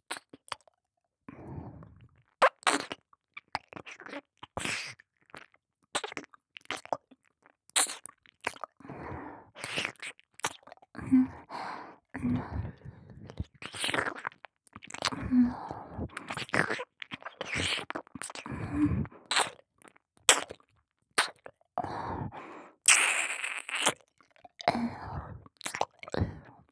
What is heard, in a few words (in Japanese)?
耳舐め・普通; 百々子